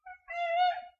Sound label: death camel; fauna